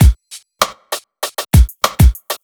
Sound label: BEAT, Hip-Hop & R&B; USB Soundscan vol.47; AKAI